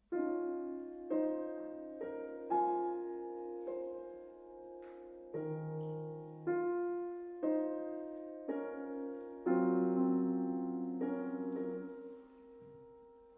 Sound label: works for piano